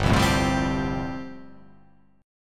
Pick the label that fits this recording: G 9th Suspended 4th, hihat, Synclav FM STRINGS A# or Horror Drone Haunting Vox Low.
G 9th Suspended 4th